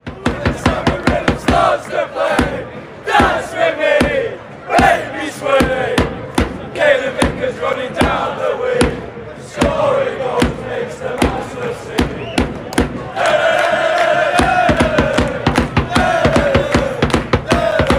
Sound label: when the samba ryth starts to play, fan chants